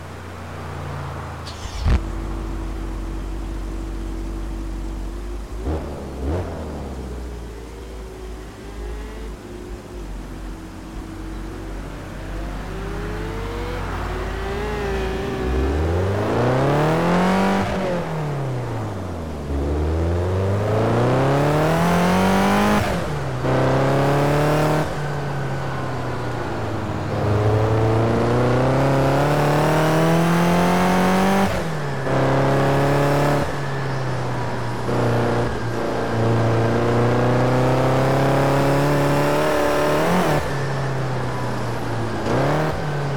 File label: bmw m5 e34; 1995